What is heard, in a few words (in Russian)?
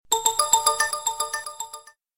melodiya sms zvuk; sms na telefon